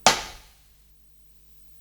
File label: Hihat, Psychedelic Drums